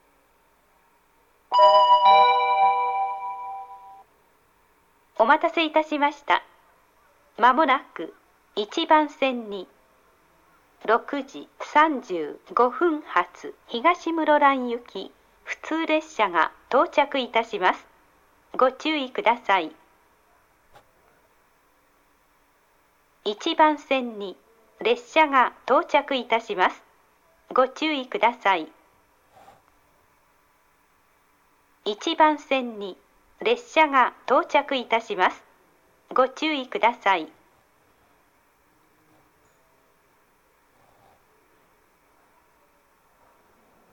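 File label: local, train station; shin-sapporo; higashi-muroran; jr-hokkaido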